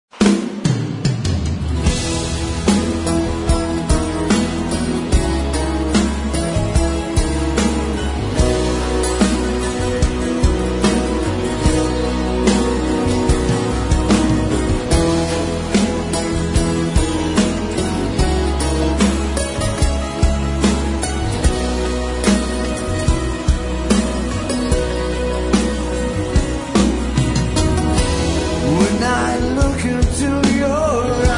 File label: Acoustic